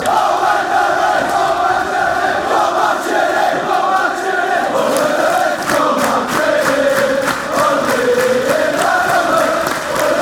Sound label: when reds go marching, fan chants